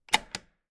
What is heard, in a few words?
Door Lock Open